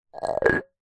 household glass coffee cup thick empty set down upside down tiled floor; zapsplat